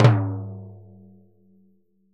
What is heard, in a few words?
TIMBLO, Synclavier Percussion; ILIO; Synclavier Percussion 2; World & Orchestral